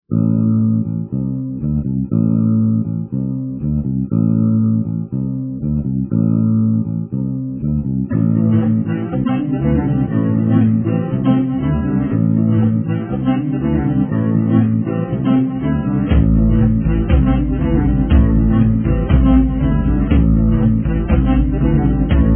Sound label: funk melody